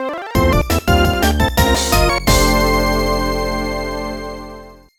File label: Jingle, Fanfare; english training have fun improving your skills ds gamerip 2006